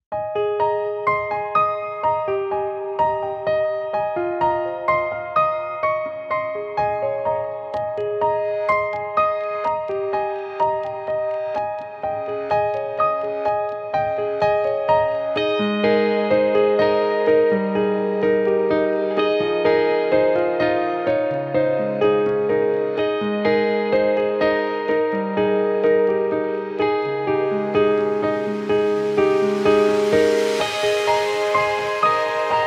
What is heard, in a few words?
beautiful easy instrumental music brain think inspirational